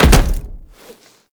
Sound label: Tenno Land Heavy Glass, footsteps; Warframe